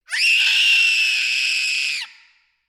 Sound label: Screaming Girl